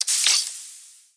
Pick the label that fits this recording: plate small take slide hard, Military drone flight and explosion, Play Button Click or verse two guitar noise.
Play Button Click